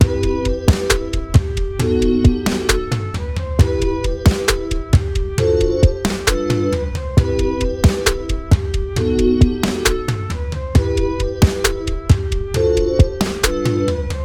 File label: Menu Loop; Pixel World